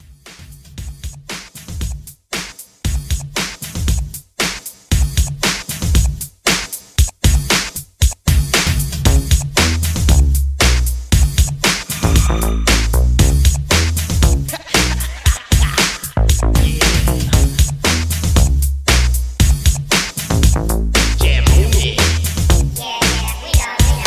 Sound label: 80s Hiphop Re Drum 116